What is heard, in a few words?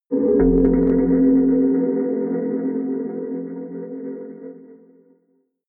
atmospheric bounce echo, drones